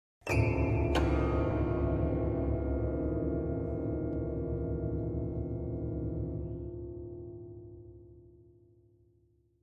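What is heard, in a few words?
Grand Piano Strings; keyboards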